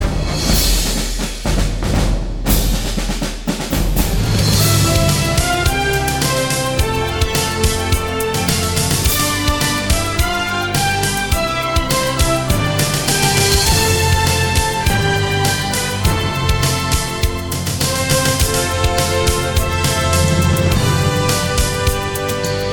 generals fanfare

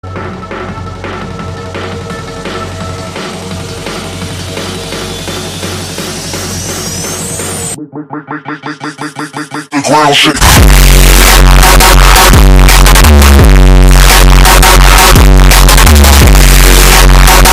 bass drop

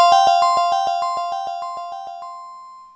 message notify iphone 12

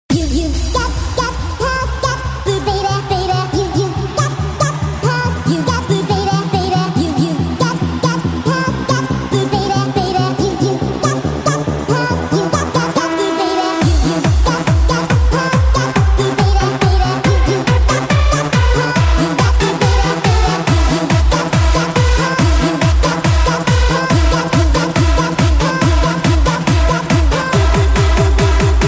techno baby

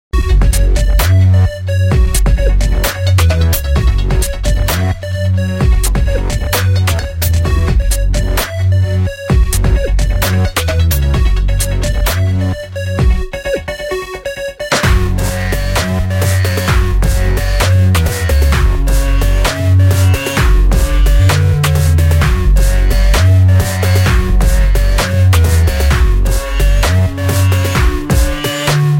electronic suspense

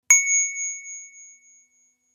sharp sms

